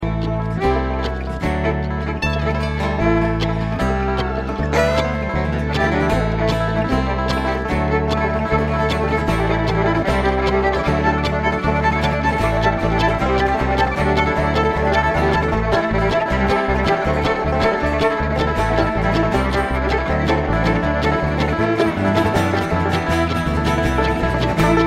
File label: Traffic Jam